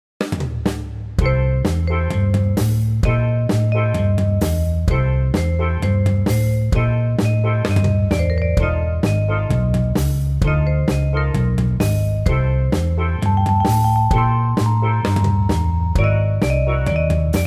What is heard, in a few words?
MIDI blues; spellman studio